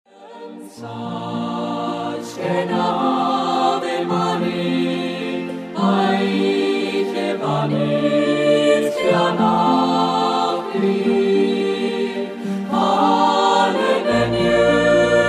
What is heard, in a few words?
Celtic Music